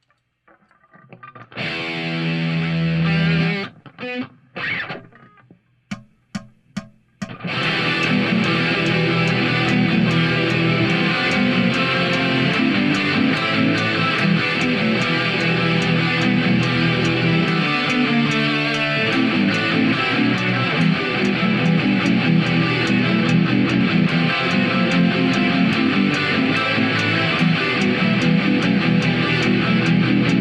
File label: Riff Tapes